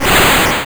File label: level fail